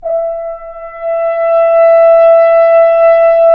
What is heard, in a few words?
BRS F.HRNS, FHns Swells; Roland L-CD702; Accent-Swell